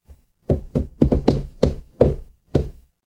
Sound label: footsteps wood